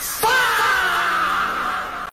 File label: faaah slowed